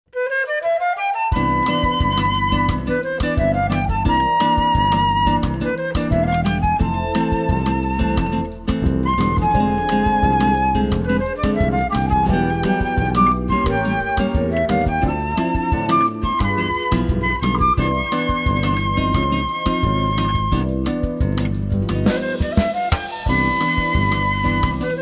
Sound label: Bossa